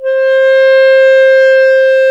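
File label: wind; Roland - LA Composer vol. 3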